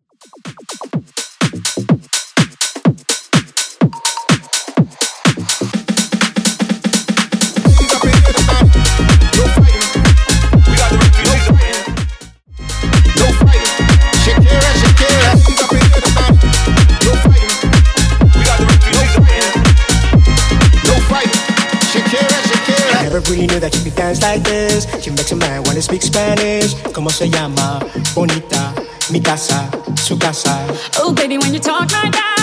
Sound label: Bass House 125bpm